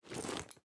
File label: cloth; gmod; arccw uc commons attachments